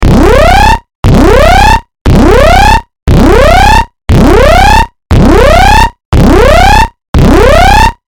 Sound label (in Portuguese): 8bit alerta; efeito sonoro 8 bits alerta